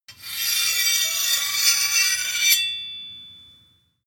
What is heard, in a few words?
sword blade grind long